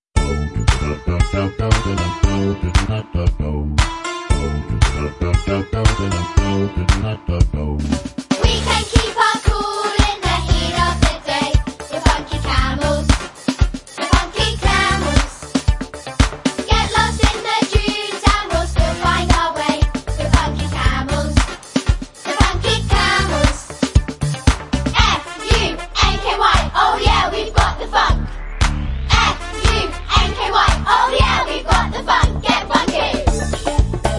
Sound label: Camel Funk (Vocal)